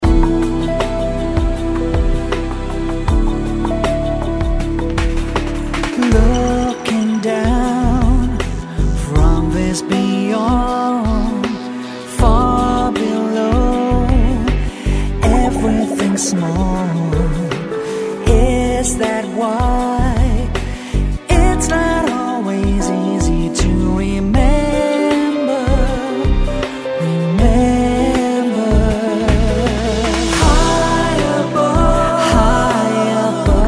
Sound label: Vocals